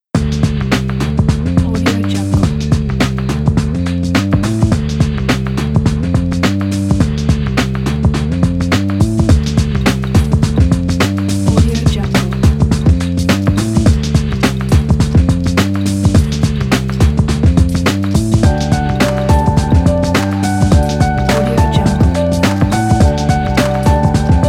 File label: lofi lounge